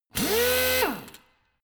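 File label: impactor soft long, drill; maschinen; bohrer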